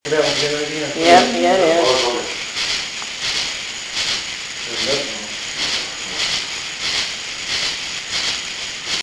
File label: scream